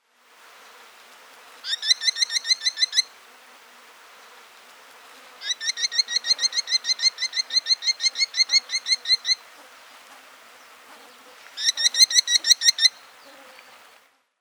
Merlin, Falco columbarius; raptors

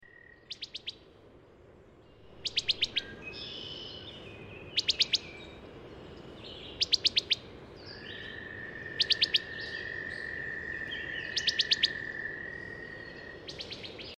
Wood Thrush Hylocichla mustelina; thrushes thrashers

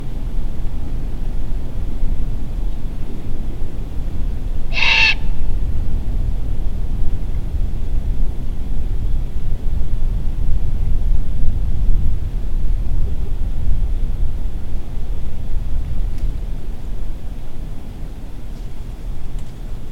Great Horned Owl, Bubo virginianus; owls

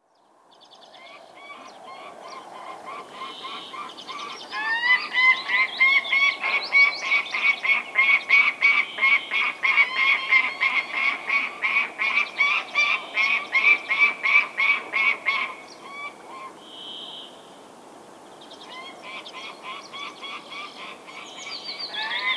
Gyrfalcon, Falco rusticolus; raptors

